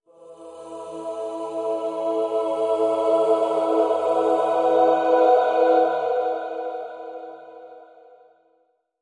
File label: Link Sting